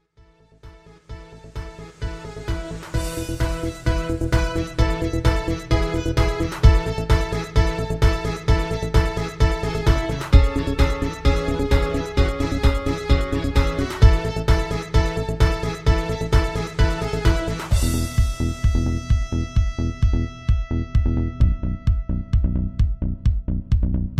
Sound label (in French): instrumental; Mixmania2